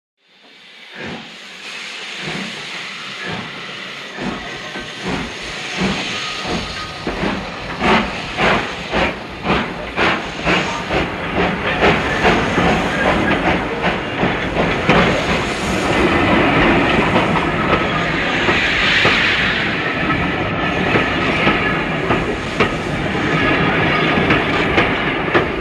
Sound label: Away Steam Train Whis